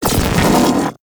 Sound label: Interrupter Shield Activate; Corpus